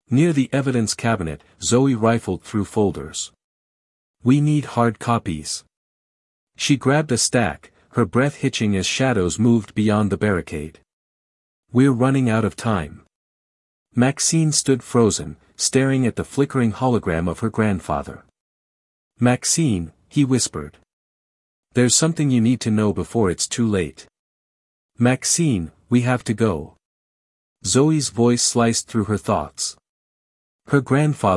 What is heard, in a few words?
whisper